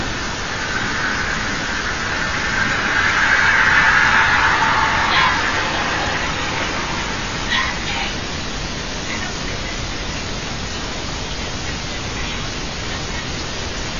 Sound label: Unexplained whispering; (Help me, Help me)